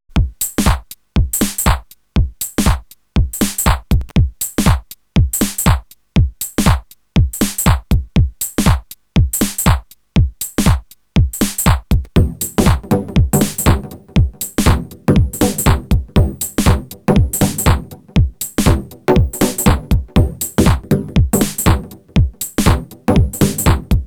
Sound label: House double med rel